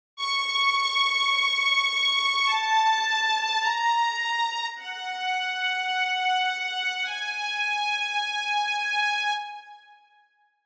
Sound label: Strings